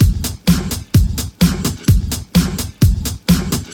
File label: House Beat, 128 BPM